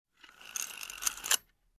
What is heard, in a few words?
Screw On metal ring